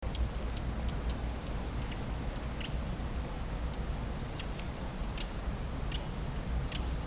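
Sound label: Ph schwarzi worrying call, background Parus major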